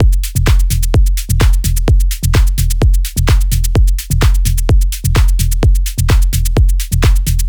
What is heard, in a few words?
Drum Loop Flow Full 128, Melodic Techno